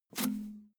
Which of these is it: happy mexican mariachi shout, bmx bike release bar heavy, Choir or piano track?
bmx bike release bar heavy